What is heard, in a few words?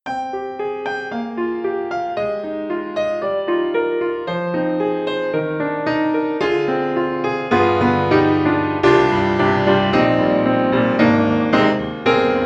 Pearl concert grand, live concert; IMPACT SOUNDWORKS